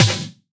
Snare, drums; Ableton Live 12 Lite Core Library

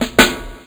Snare, drums; Ableton Live 12 Lite Core Library

